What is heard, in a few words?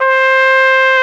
BRS CORNET; Roland L-CD702; Orch.Ensembl